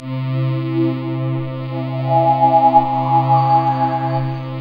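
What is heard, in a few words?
synth Morpheus; Sound & Vision - Gigapack II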